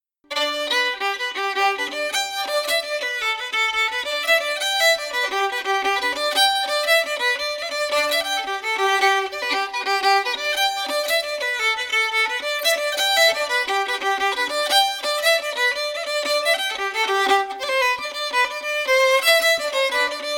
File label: jig fiddle; tune tutor; SeamusGrantFestival